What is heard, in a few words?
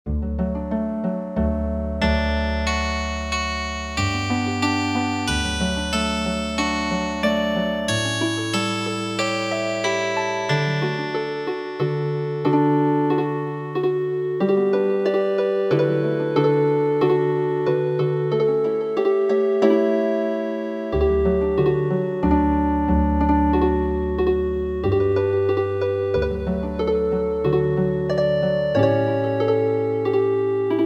Healin Harp